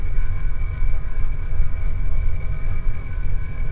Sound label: hum